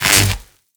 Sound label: Mining Laser Fire Stop; Eidolon Plains; Eidolon Mining Lasers; Weapons